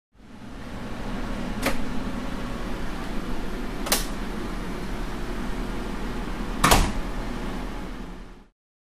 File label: Train Door Clicks